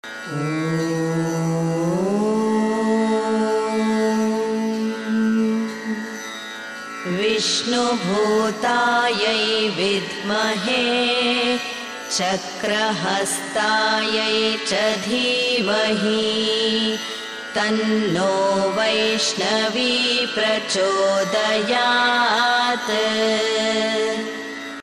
vaishnavi gayatri

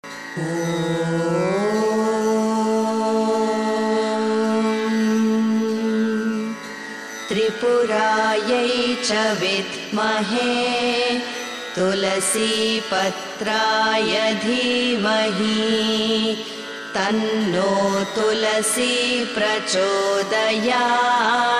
tulasi gayatri female voice

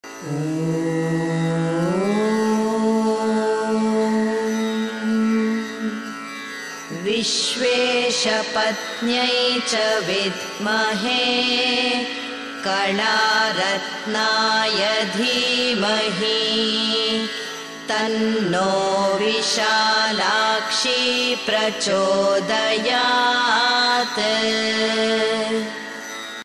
vishalakshi gayatri

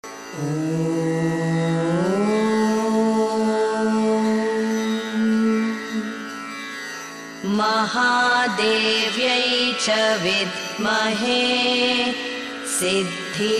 mukambika gayatri

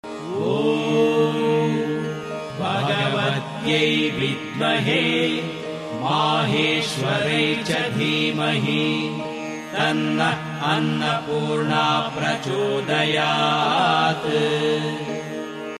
annapurna gayatri male voice

